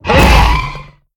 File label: Carno sound